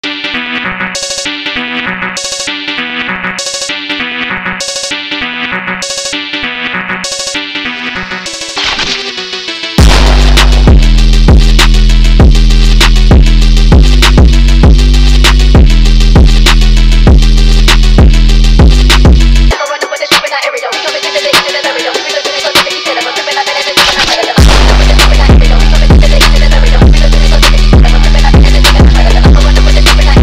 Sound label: Phonk Drift